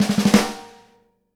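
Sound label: snare stroke hi; E-MU Producer Series Vol. 8 – Platinum Phatt; Live Snares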